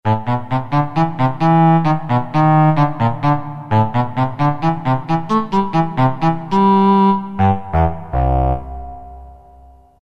Bassoon, Roland MT-32

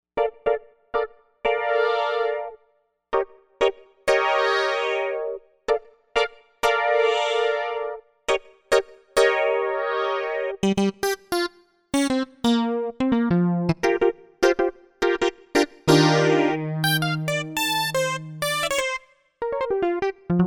Plucky Affair, Novation UltraNova; Factory Patches

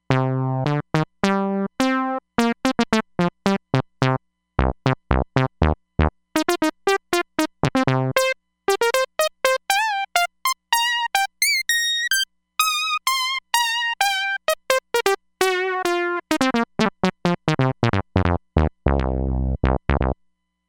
Mogulator Ld, Roland Fantom XR

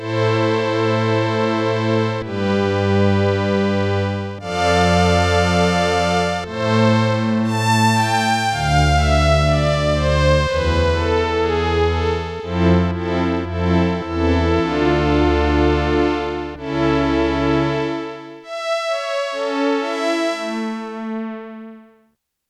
Korg DW-8000 BRIGHT STRINGS, Factory Patches

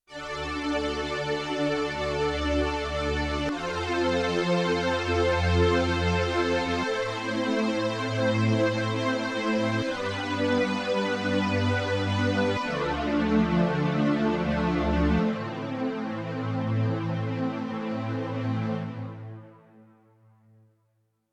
Synth String Pad I; Yamaha TX16W

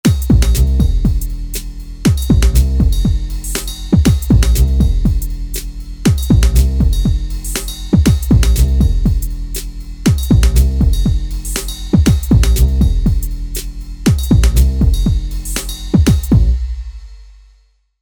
TripHop

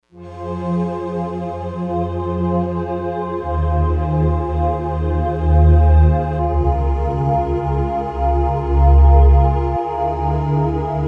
Stacked voice; Digital Synthesizer

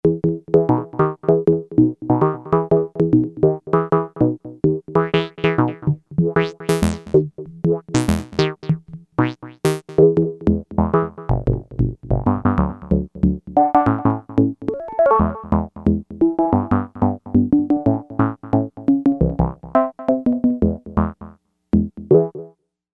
Harmonic Ripple

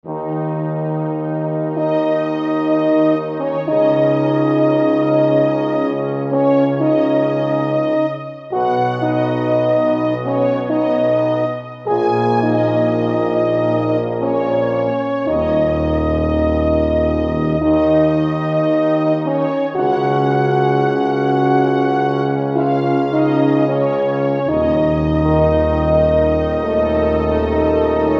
Fr Horn Strings

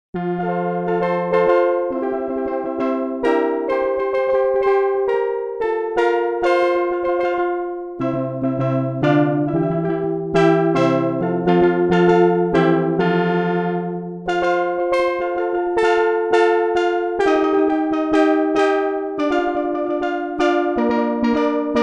STEEL DRUM, Korg DW-8000; Factory Patches

